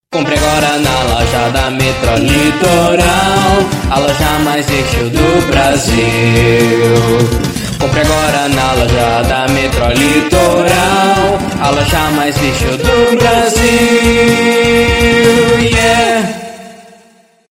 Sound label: jingle; loja metrolitoral 2020